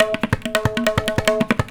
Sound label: tablas, Indian Traditions; USB Soundscan vol.45; AKAI